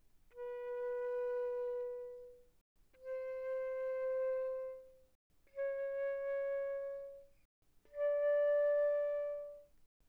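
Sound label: Flute non vibrato pp B4 Bb5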